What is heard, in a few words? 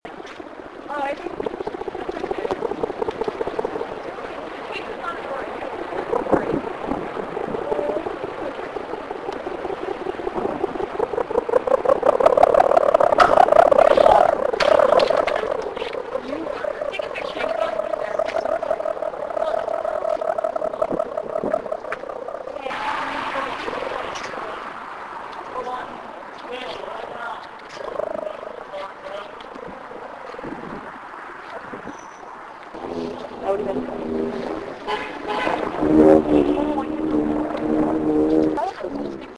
string sound; The Longest String